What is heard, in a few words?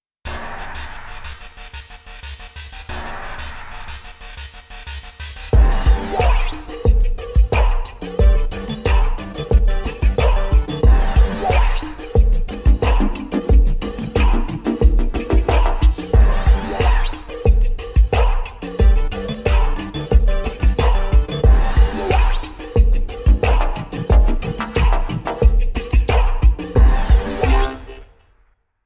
techno; xp-80; roland; Multi-patch demo; roland demo cd-3